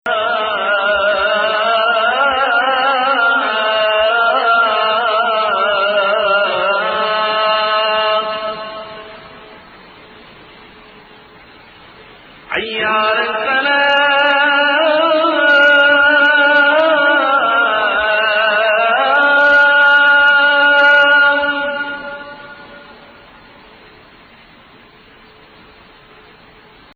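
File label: Hijaz Adhan Hijaz, Adhan (call to prayer), prominent use of both step below & 5th scale degree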